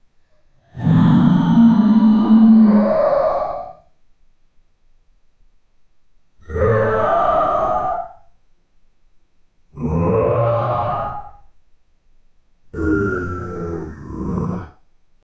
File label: inhuman uncanny creature, chase